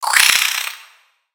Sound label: Spider Angry